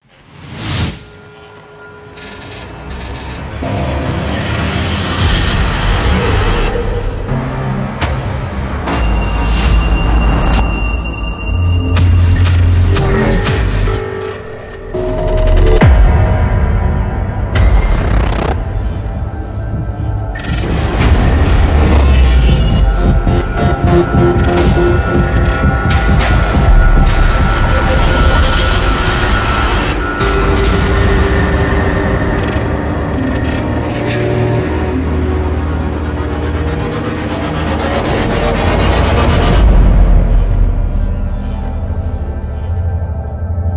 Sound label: Sound Design